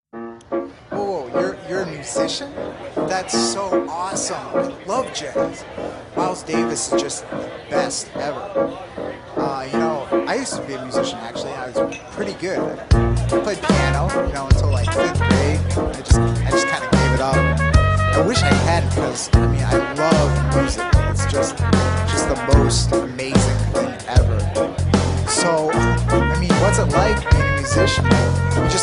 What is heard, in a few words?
Jazz